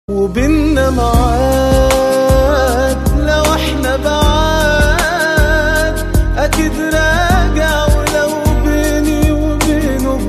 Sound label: arabic music